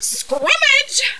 scrum; tfc

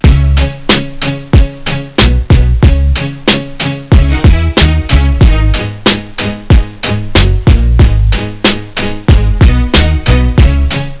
beat

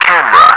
camera

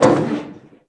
duct, player; firearms

